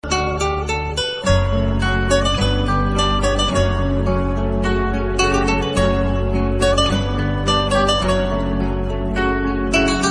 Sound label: Romantic Music Instrumental Ringtone; ringtones